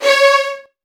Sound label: Virtuoso Strings, C#2; ILIO; Kirk Hunter Virtuoso Strings VOL-1